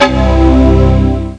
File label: Musical Etude; Speed Message II